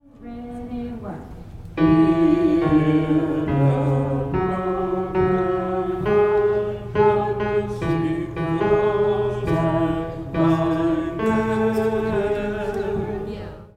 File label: The Lord Will Heal the Broken Heart tenor